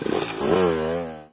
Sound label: Moto Leaving Faster; Farm Mania